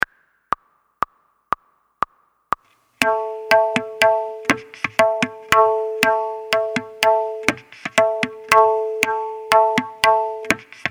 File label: Garba dance tal Practice